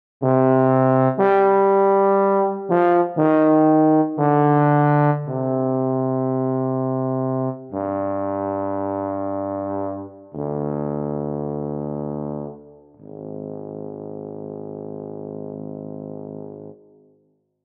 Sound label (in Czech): TUBA bez vibrata